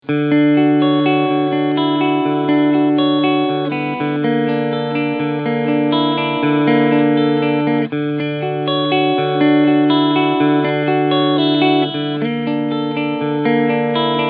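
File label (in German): Gibson Les Paul Tribute STB Cleansound beide Pickups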